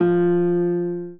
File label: spanish guitar